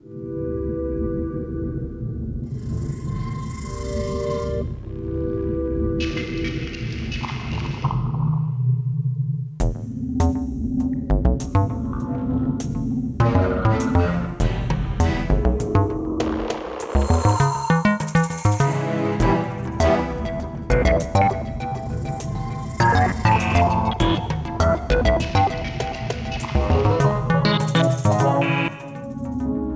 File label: Eerie